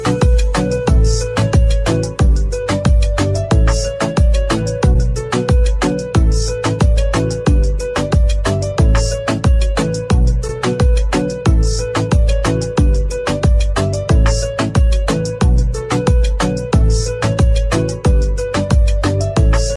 hindi love ringtone, bollywood ringtones

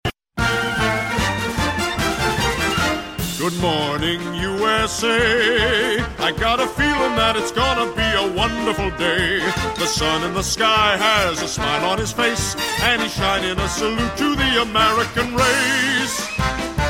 good morning ringtone; usa; english ringtones

